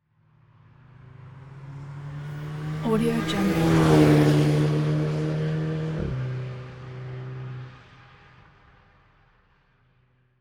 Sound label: passby sportscar single; soundbits-soundfx